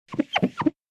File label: Glass window cleaning